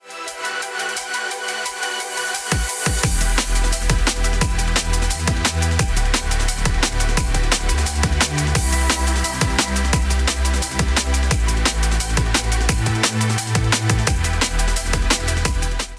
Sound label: Drum and Bass; Curso Masterizacao para Musica Eletronica